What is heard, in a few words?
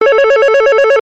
Panasonic Ring